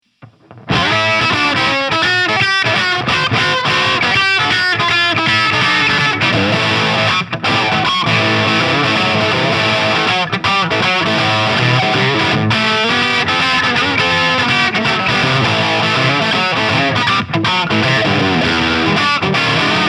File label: ML Oceanburst Crunch; Dean Guitars